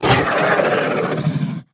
Monster Attack Finisher; Monster Lizzie Attack Finisher

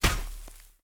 House Impact; gamerip; Banker

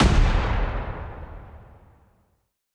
Hydra Hit; Legend of Keepers (gamerip) (2019)

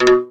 Level Locked Ui; Superbrawl; Nickelodeon Super Brawl Universe; Android

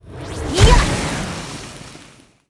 ArcDivina F attack

